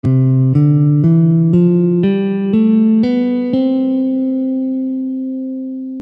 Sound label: Ionian C, Guitar